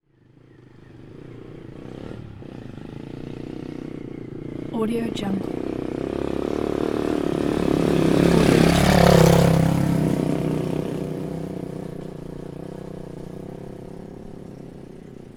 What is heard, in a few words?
motorbike passing by